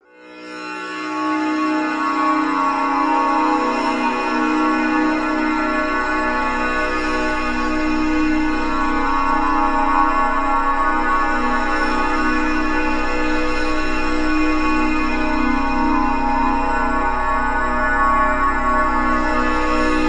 activating tanpura

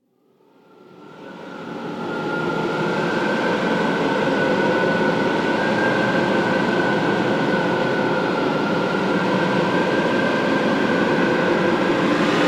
space white noise

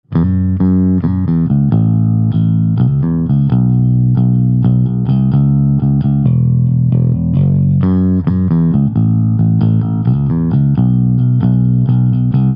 bass healing

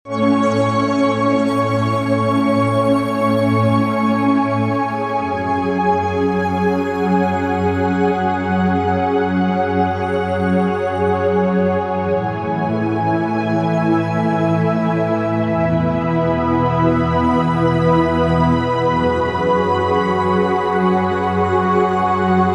yoga nidra music

